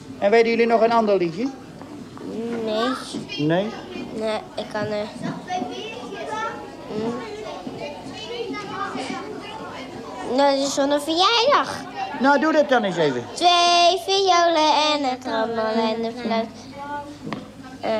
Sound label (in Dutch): Twee Violen En Een Trommel En Een Fluit